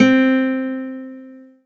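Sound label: Yamaha TG500 Guitar Nylon C4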